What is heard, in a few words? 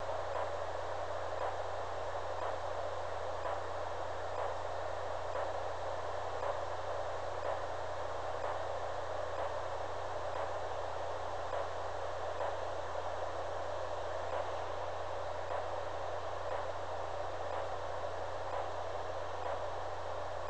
Open Squelch, Radios; ProMark3 RTK Radio; PM3 RTK Radios